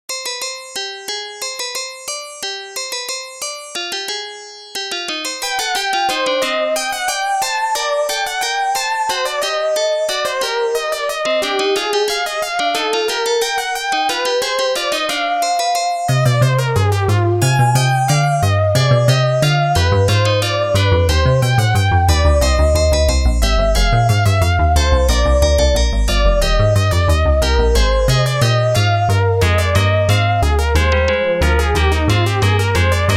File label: Fugue C minor